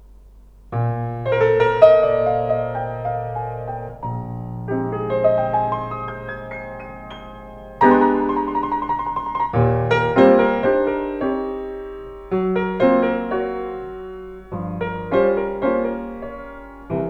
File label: Inlaid Steinway Model A Grand Piano